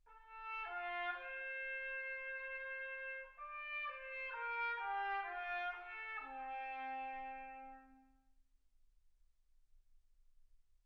trumpet

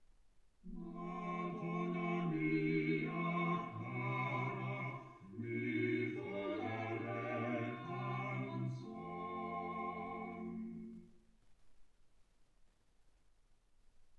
quartet

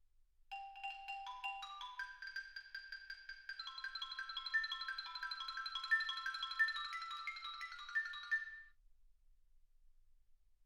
xylophone

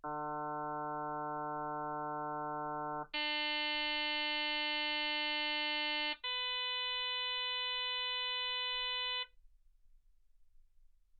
harm sinus 48kHz

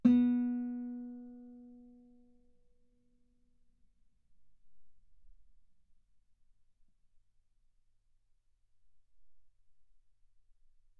Guitar B3; ff

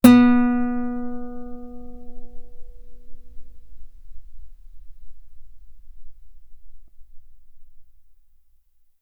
Guitar B3

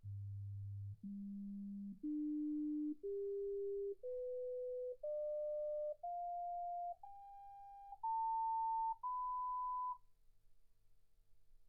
sinus tones 48kHz

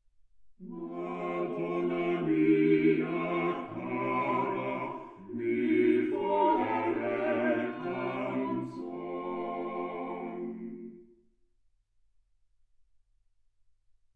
quartet

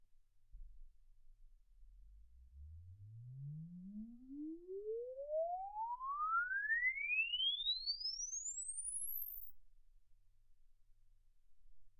exp swept sinus 10Hz 24kHz

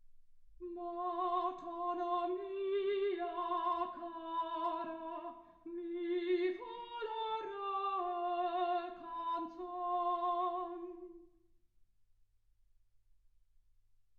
soprano

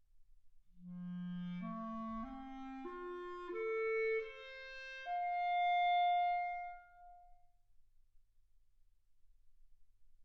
clarinet

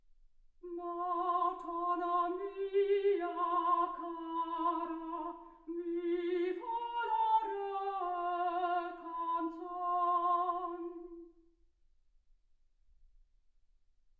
soprano

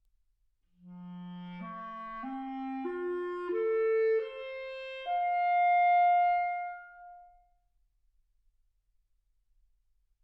clarinet

